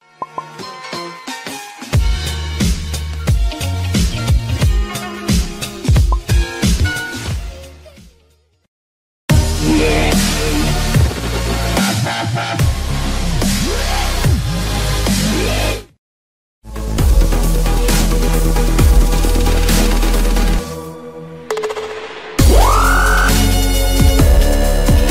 Nhac nen mo dau video

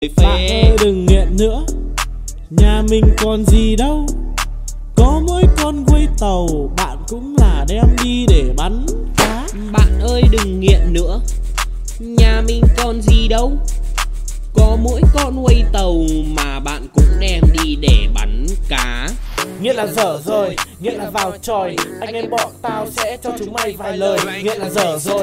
Bai hat

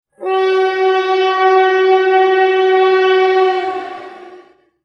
Tieng coi tau thuy

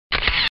Tieng man trap chup anh dien thoai di dong

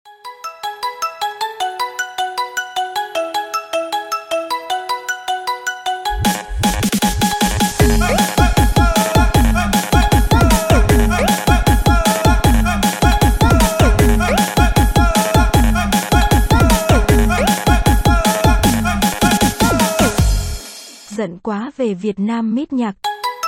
Nhac chuong xiaomi remix

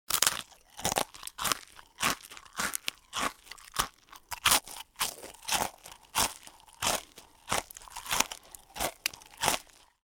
Am thanh an hoa qua

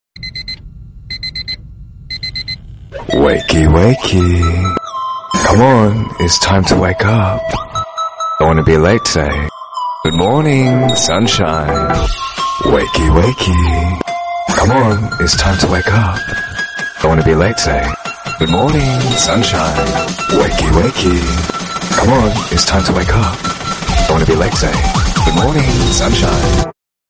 nhac chuong good morning wake up bao thuc buoi sang

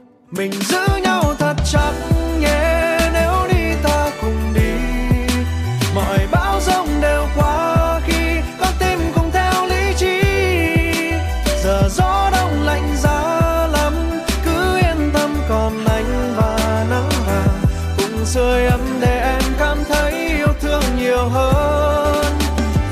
Nhac chuong

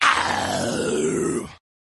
Tieng keu ac doc dang so cua ma quy

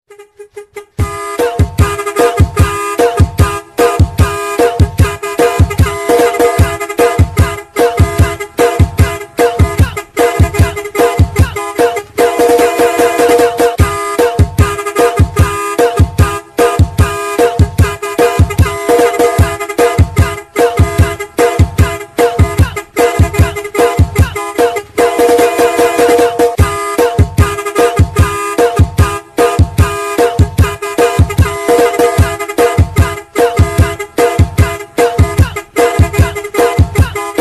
Nhac nen tieng coi xe remix; TikTok

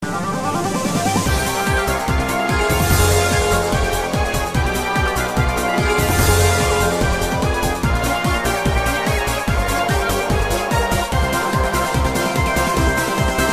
Nhac nen; ai la trieu phu

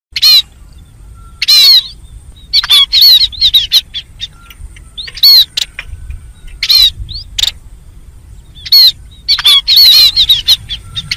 Tieng chim Ri sac o

